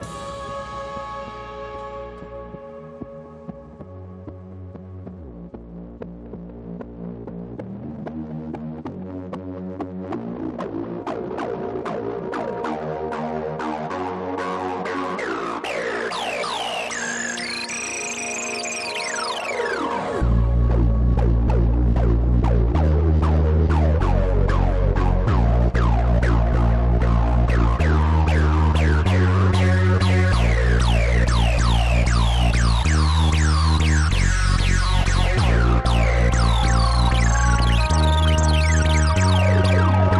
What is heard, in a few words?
Minimal Electro Version No Drums